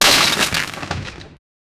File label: the iss gets destroyed; space station